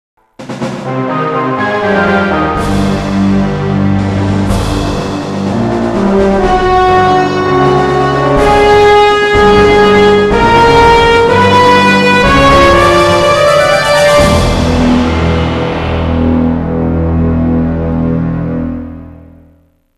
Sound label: mine chase; Indiana Jones and the Infernal Machine; solomon minechase